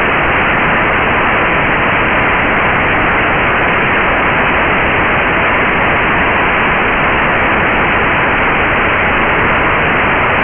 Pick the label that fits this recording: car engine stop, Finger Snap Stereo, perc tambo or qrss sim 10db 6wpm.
qrss sim 10db 6wpm